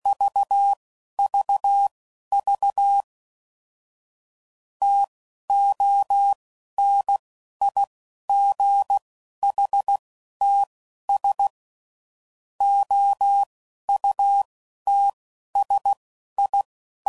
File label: CW Code Groups 10 WPM, Weather